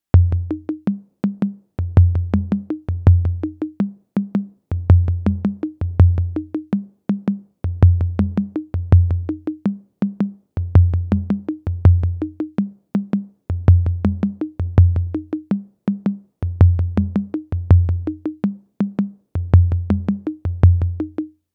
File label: Behringer RD 8 Toms Loop